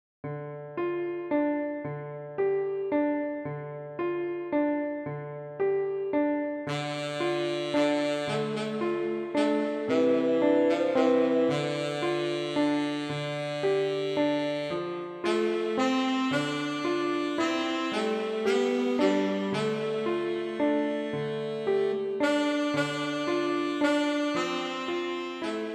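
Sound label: tenor saxophone; Scarborough Fair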